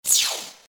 electric sound

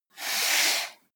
computer mouse draw long

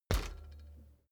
bicycle street bump front short

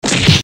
fist hit seq

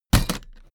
suitcase hit empty case

